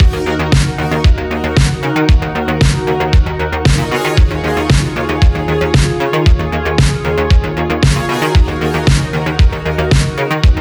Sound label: Boss Defence Intro 115; Wise The Wise; Furi